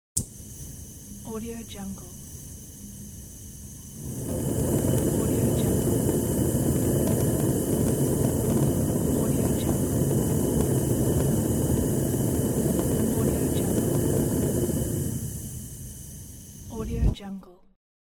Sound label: gas stove